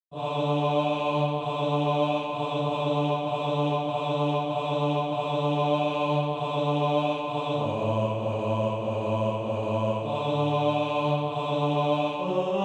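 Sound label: bass; SATB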